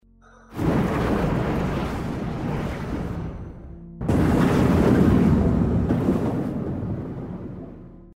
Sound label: Torpedo; realistic atmo; realistic atmo pack